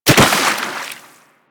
Land Soft Water, Footsteps; Warframe; Operator

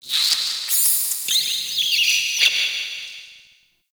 Crypt Rat Vocal A; Ambience; One Offs; Champion Crypt

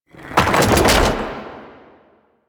Archgun Charged React; Warframe; Archwing

